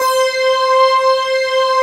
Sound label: SOLID M SYN, SYNTHS; Pro-Rec - Synthsonic